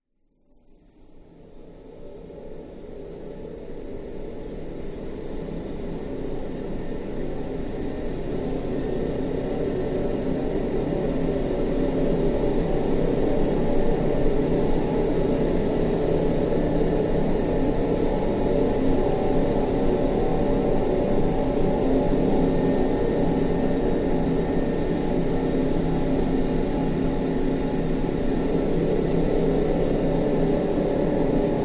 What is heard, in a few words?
drone dark desolate